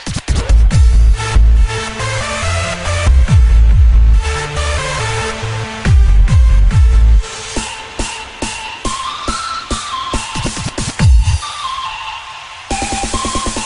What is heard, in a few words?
Techno Disco